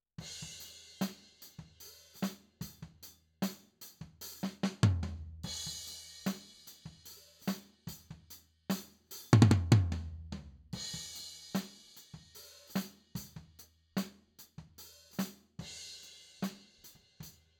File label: Tom